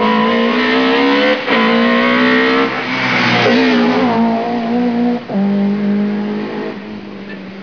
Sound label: Distinctive Note, engine; engine purity